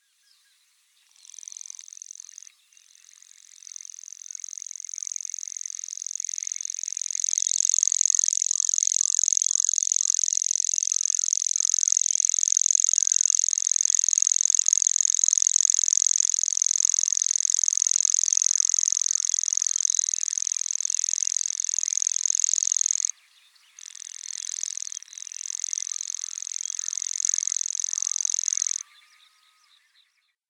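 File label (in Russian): obyknovennyy sverchok golos, grasshopper warbler voice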